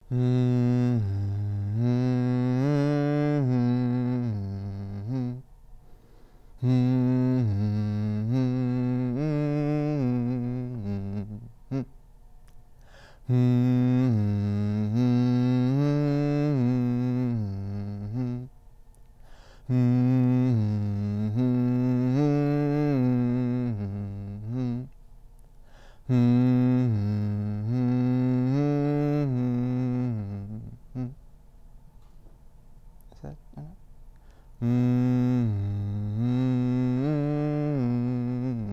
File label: Bassline; ArtsBreak HHC